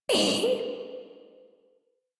Pain; Warframe; Shik Tal; en

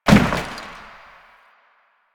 Janitor Cart Impact Short

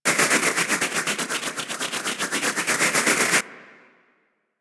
Player Resurrect

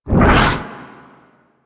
Rupture Cast; Warframe; Warframes

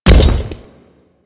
Step Default

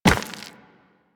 Run Rock, Footsteps; Warframe; Operator

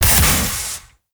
Mech Jump Boost Stop; Nechro Mech; Vehicles

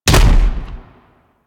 Dex Sybaris Shot C; Warframe; Weapons; Tenno

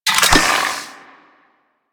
Secondary Mech; Weapons; Cannon; Kuva Zarr; Grineer

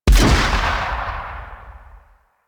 Weapon Punch Burst Gun; Heavy Weapons; Arch Burst Gun A

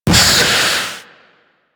Proc Gas; Mods

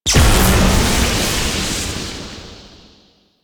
Void Dragon Orb Proj Explosion Lightning; Enemies; Abilities

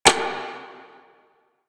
Hack Minigame UI Time Tick Urgent A

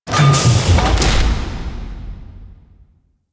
Battlecrafting UI Condense; Warframe; Railjack Player Ship

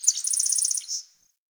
Wetland Forest Day Insect Close; Ambience

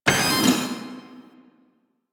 turnstile close; ambience; Warframe; Entrati

